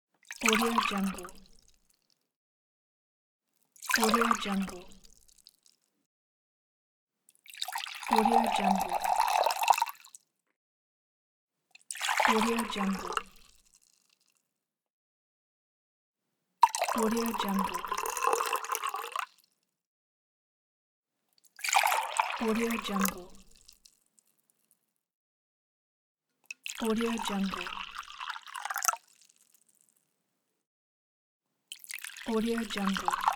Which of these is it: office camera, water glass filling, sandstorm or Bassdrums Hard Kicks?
water glass filling